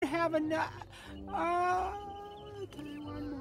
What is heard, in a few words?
ahhh; Carl Wheezer